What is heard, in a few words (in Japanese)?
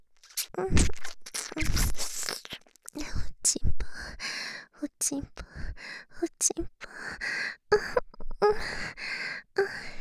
耳舐め喘ぎ; 淫語ヘヴン